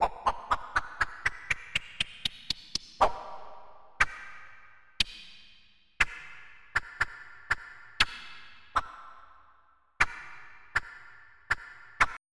sword; Populous Demo

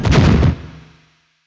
Chain blast; 22,050 sample rate; ImpGal2; spacewar

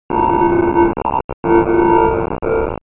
Noise; aloneinthedark4

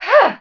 jump; PC Gamer IT CD 41; Daikatana; mikiko

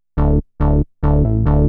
bass C; Techno; TechnoMusicMaker

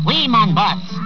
wee

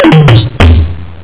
tom drum

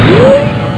thong, cartoon sfx

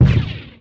rocket firing; warzone

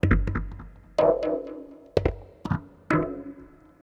PERCLOOP ETHNO 125; eJay

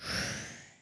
Camera Pan; worms

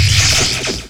SHIELD; Shadow

